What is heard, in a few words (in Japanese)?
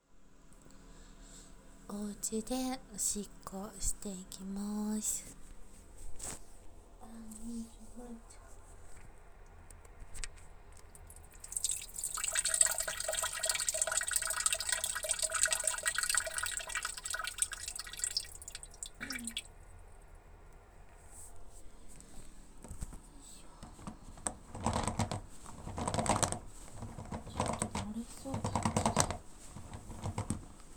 お家のトイレでオシッコ